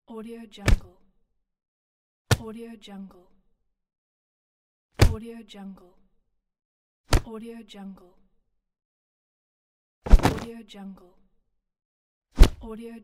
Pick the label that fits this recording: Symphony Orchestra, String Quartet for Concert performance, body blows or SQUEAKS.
body blows